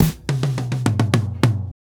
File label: Tom Loop